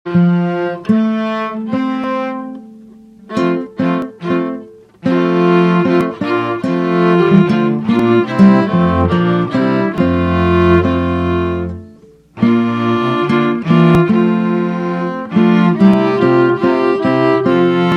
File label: guitar bow; Quando ritrovo